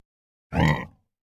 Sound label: Vulcanpig Grunt; Super Mombo Quest